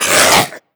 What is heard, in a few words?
choke, zombie vox; creatures